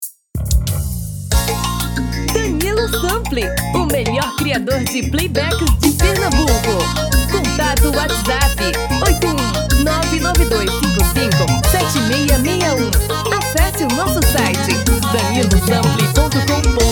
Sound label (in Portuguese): tom masculino